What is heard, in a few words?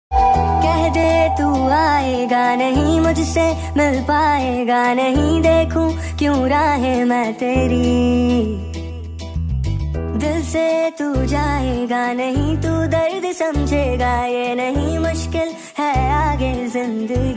Sad Song Ringtone